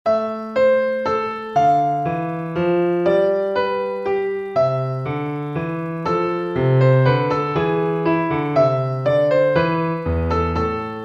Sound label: menuet